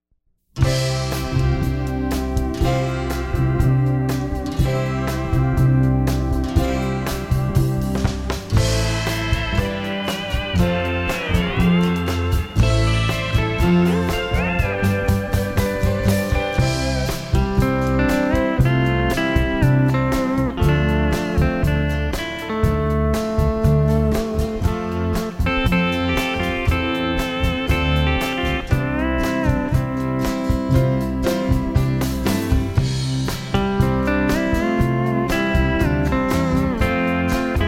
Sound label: Ballad